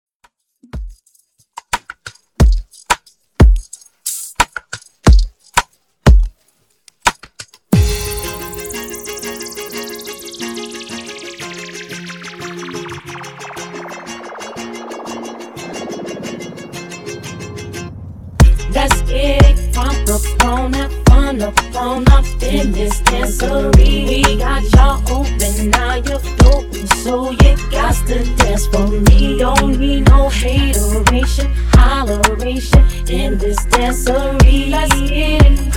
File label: Afro Beat Mashup